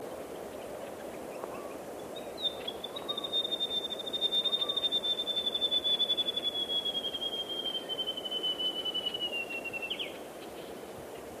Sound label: Plain gerygone